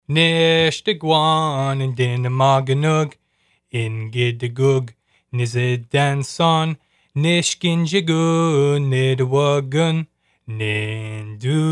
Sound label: Head and shoulders Song